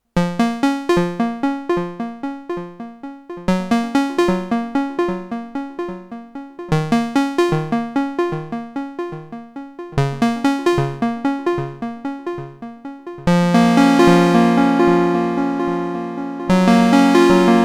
Free the Tone Future Factory Synthesizer Standard Delay